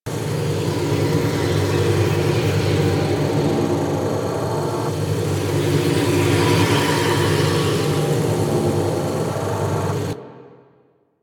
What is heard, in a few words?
Large Ramp Hall Tech Ambient Loop; Warframe; Ambience